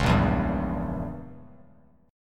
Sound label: Bb Diminished 7th Bb Bass Standard; strummed; JGuitar